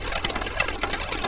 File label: SEW MACHN, Anime Sound FX; COMIC